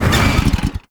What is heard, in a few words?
Stop, Motorcycle; Warframe; Vehicles; Motorcycle Ninety Nine